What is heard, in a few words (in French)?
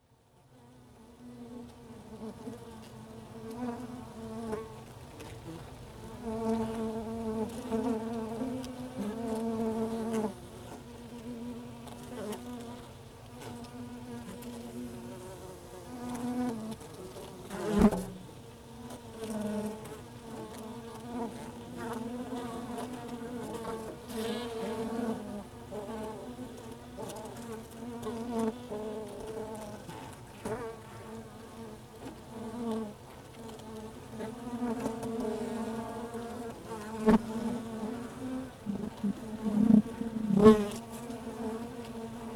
Ruche Interieur; Nature